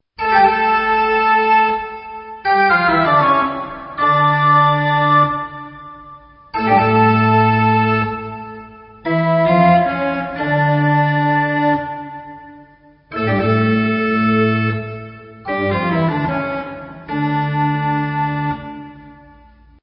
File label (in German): toccata, drehorgel